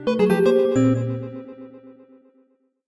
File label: jingle chime negative